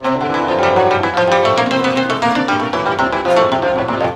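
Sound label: ZIMBAL MELODY; Best Service; World Colours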